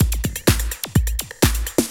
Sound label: loop, 125; Sound & Vision - Gigapack I